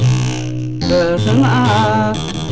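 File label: bass kengong; Zero G - Ethnic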